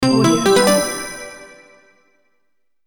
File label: bright right answer; cartoon